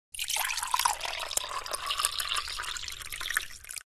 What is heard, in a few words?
Liquid water pouring in a glass container